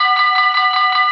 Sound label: Crossing, Trains; PFx Brick